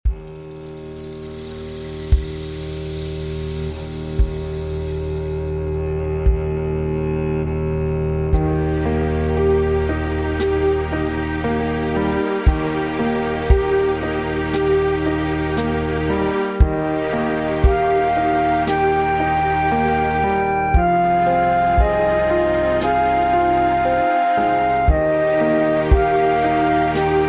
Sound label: Suo Gan Practice Tempo E Flat